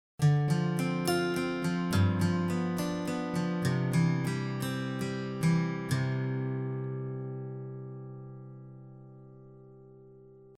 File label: arpeggio guitar